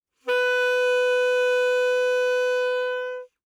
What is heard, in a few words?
Alto Sax No Vib ff B4, Eb Alto Saxophone; Woodwinds; MIS Pitches - 2014